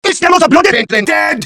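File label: demoman pain critical death; TF2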